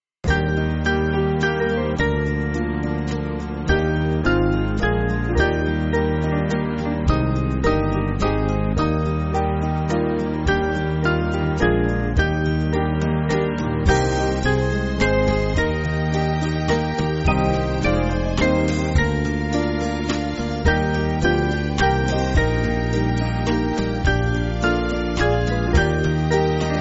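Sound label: Band, Backing Files for Churches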